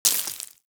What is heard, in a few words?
Bullet Impact Debris Dirt; Warframe; Weapons; Bullet Impacts